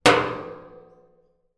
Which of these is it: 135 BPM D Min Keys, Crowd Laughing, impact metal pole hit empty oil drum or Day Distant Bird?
impact metal pole hit empty oil drum